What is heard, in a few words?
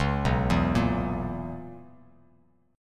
B Major 7th Suspended 2nd Suspended 4th B Bass Standard; arpeggiated; 9,2,2,3; JGuitar